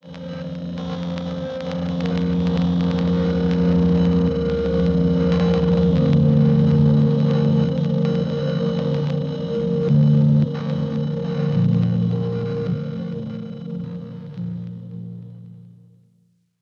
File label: Atmosphere; 99Sounds; InterSpace